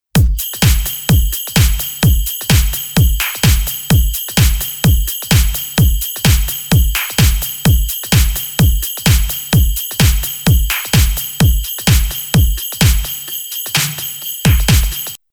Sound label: Kick Beats; pack noel